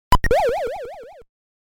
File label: die Water; Frogger; Arcade